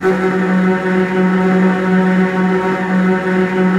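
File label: STR TREMOLO, Virtuoso Strings, F#2, right; ILIO; Kirk Hunter Virtuoso Strings VOL-3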